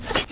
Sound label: mortar load